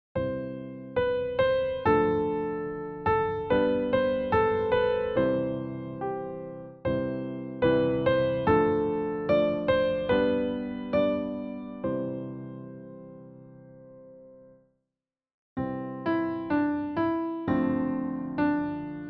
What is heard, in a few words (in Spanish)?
Piezas con acordes